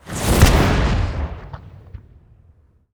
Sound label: missile land; Counter Strike Model Pack; CS:GO; survival